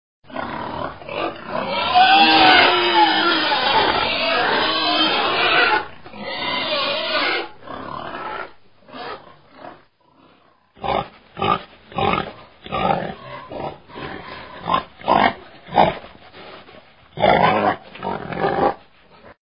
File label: Pig Squealing and Oinking